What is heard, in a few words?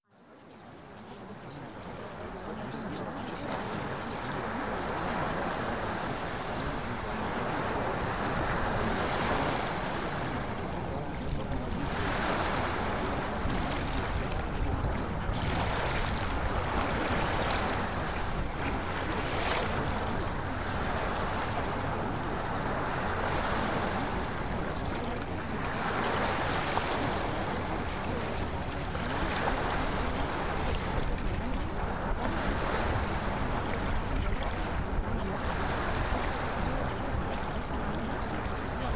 Ocean Waves